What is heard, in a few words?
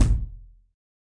middle kick; Hip Hop Specialty Kit; STYLE KITS (Hip Hop, Etc)